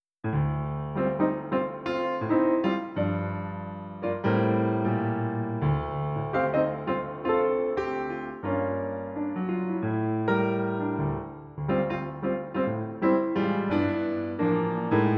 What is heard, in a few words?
piano